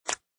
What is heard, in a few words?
button